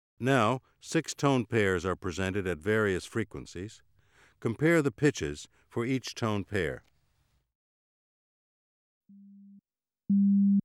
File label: Dependence of pitch on intensity